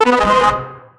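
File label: resistance effect rpg game; ability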